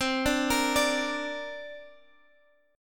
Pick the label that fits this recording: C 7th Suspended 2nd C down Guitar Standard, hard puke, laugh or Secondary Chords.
C 7th Suspended 2nd C down Guitar Standard